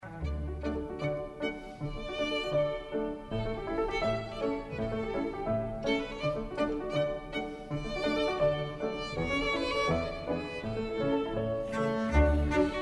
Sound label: piano quintet a major; schubert